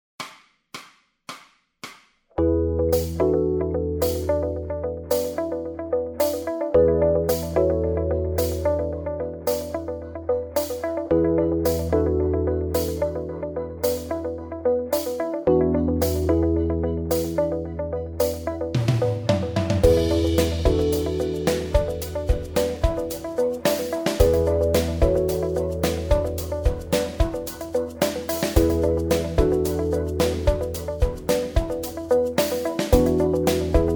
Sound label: bass modes, backing tracks